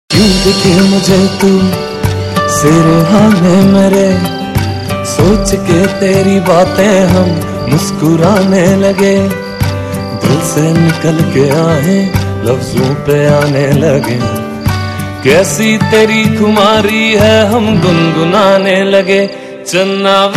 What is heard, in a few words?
Male Version